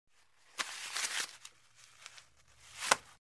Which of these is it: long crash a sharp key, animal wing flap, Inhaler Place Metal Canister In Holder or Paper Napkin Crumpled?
Paper Napkin Crumpled